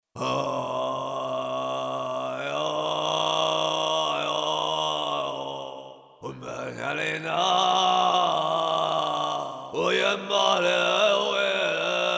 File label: voices Shaman deep chants